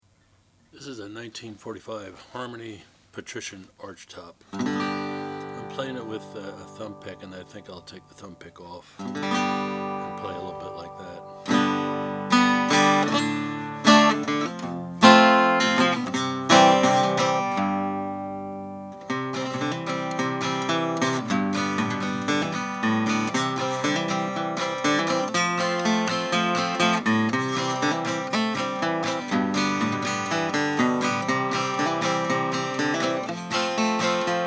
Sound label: harmony patrician h1415 natural